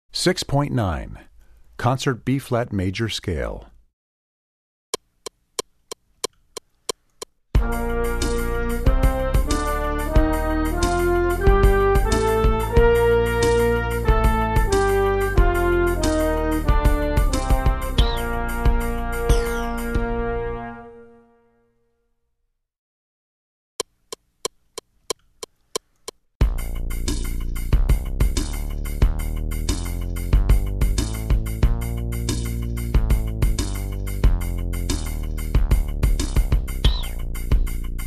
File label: Concert B-flat Major Scale